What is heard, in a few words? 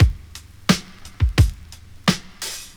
87 bpm 00s drum beat g key